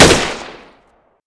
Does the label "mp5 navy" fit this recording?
Yes